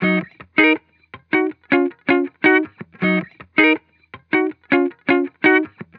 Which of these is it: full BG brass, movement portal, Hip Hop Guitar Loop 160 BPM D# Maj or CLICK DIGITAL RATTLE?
Hip Hop Guitar Loop 160 BPM D# Maj